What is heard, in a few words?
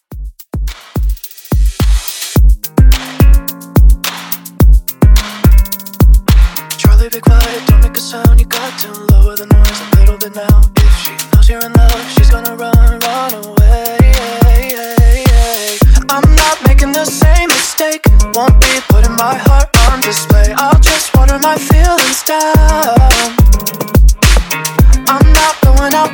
Top40s 107 BPM